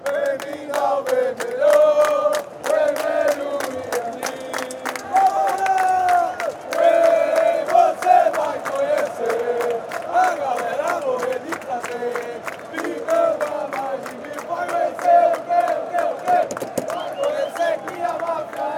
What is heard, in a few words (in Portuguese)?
bem vindo ao vermelho, fan chants